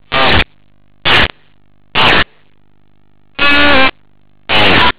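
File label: guitar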